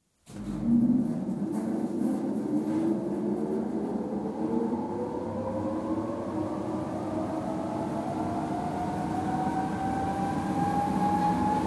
Grain Dryer, interior, on floor generator & fans, starts, runs through cycle & stops (Close perspective recording); Farm; Farm Grain Dryers; BBC